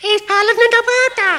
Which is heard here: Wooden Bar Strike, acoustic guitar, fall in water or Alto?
fall in water